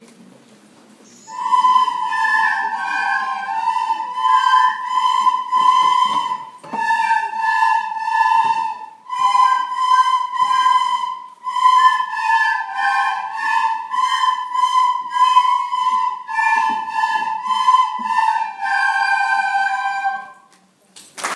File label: concert yr3 recorder